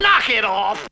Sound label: VOX